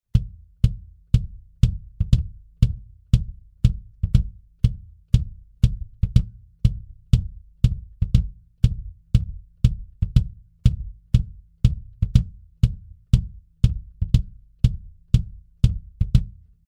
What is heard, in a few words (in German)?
V-Kick Resonanzfell Modern Modern; sE Electronics